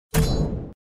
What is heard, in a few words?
drop